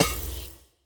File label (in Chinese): 试炼刷怪笼放置声